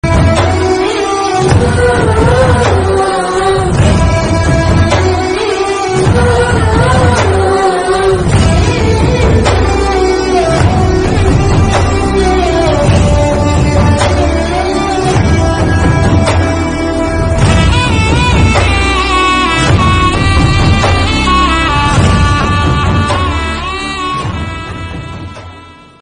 mother sentiment kannada bgm background music

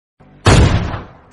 gun message

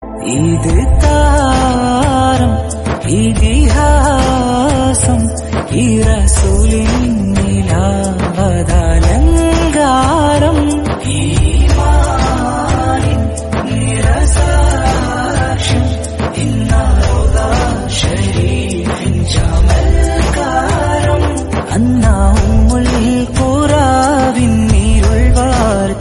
islamic devotional

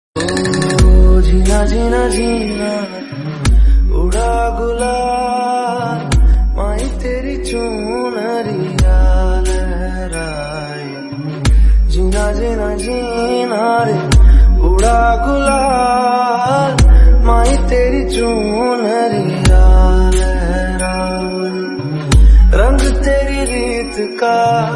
slowed reverb

